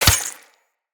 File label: Melee Dagger Impact Flesh